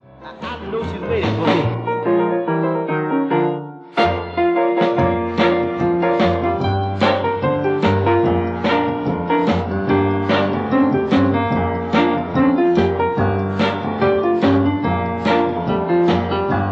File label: Solo with fades